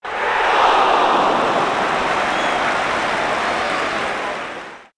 big ooh

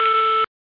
Tel Busy; Hame-Dori Hunter Syatta

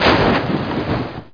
roll; Prangs

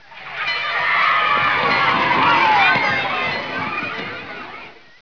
School; CAESAR3

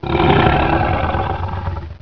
Shark Growl Medium; SharkByteActor; TheJawsFAT

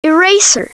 ERASER

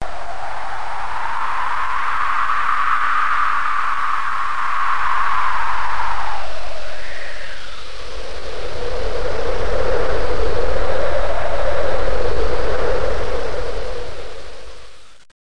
wind; Kenji体験版

